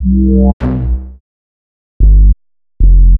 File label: Bass, Futurist Drum & Bass